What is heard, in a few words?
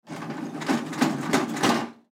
Paper Towel Dispenser; Paper Towel Dispenser Manual Crank Handle; Industry Paper Towel Dispenser Manual Crank Handle Public Bathroom; Blastwave